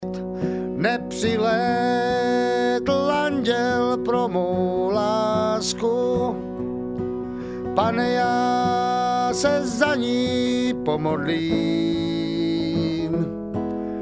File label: vocLead, bluegrass